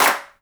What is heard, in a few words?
clap, rock drum kit